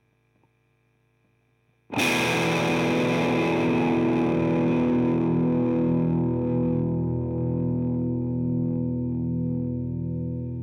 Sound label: kma fuzzly bear more skin